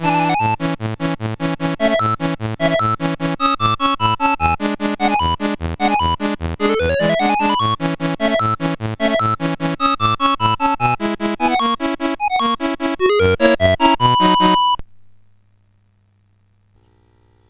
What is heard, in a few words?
Tritsch Tratsch Polka; CSG8580R5